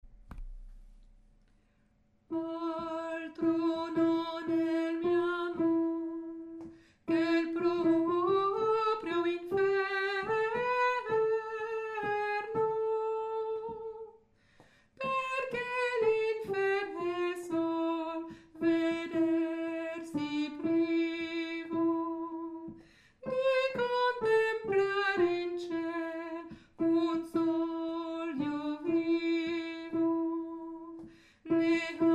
sop, chorale; repetition agen septembre 2024

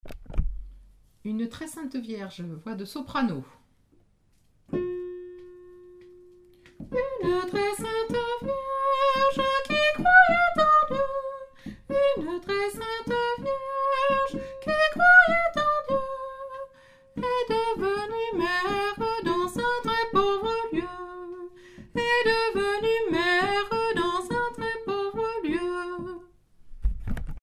soprano; une tres sainte vierge

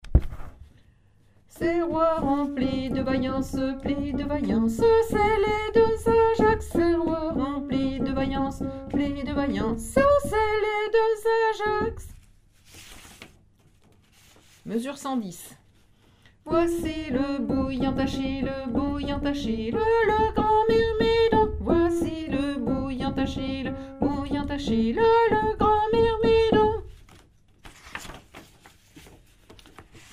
soprano, chorale

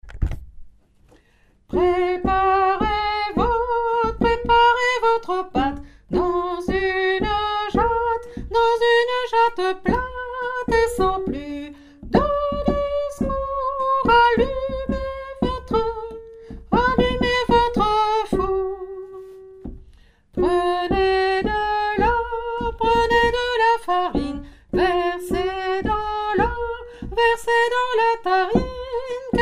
soprano, choeur femmes

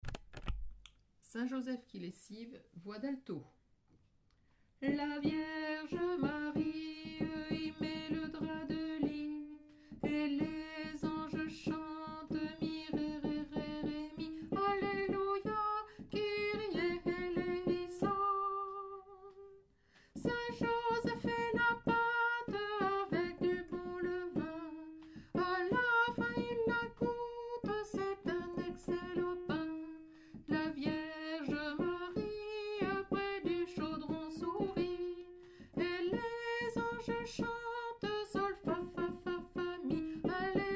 alto, chants noel; saint joseph; nouveaux noels

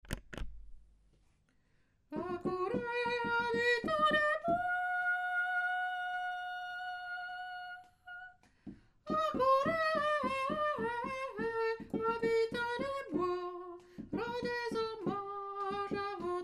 choeur de chasse soprano

